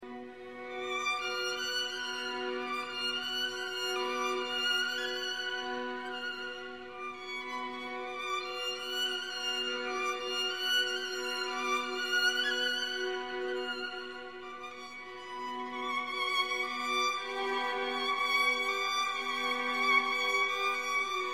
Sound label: Seven Romanian Folk Dances for orchestra; Bartok